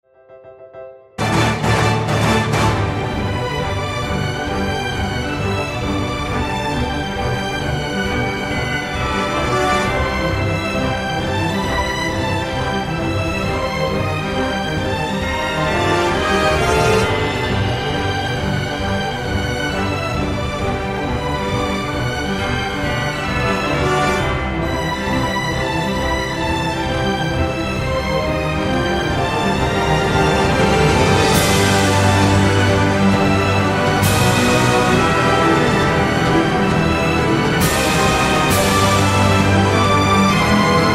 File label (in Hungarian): zenekar; adventi